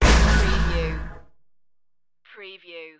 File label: starship door access